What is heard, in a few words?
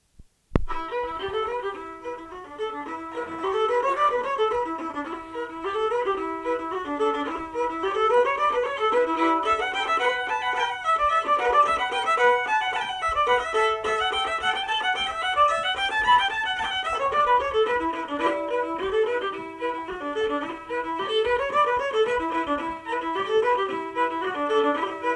fiddle in Em flute solo